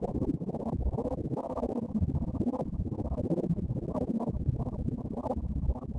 tunnel, tracks

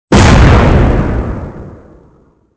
explosion short; weapons; Soldier Of Fortune II Double Helix; XBOX

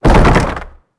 door shake

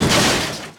smash

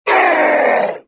death; galak

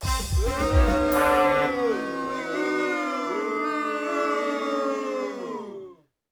music race lose; TyTheTasmanianTiger3; XBOX

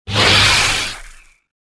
saber hit; weapons

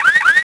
alarm car loop; ambient

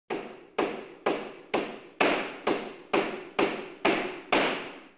hammer, SHIPYARD; AMBIENT; Pirates Of The Caribbean

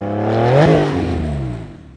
turbo; Pure Pinball

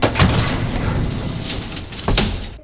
elevator door open; Tony Hawk's Project 8; XBOX

